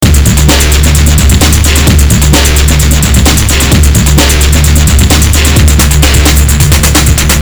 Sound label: depressing rock music